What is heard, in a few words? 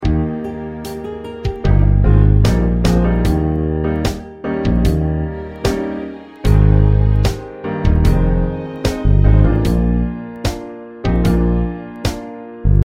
Accompaniment